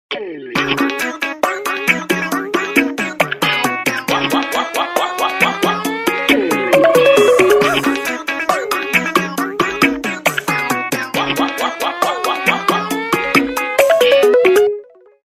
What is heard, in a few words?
Samsung ringtone Bang Bang